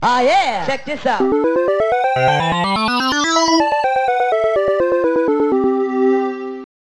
truck music

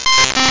tense

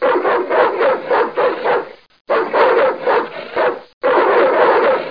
Fountain Dogs Bark

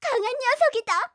Lose Point; FantasyTennis

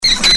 chimp; monkey; animals

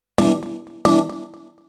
fields, 140bpm keyboard; hous-tec

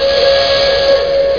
Wailer; dithell in space

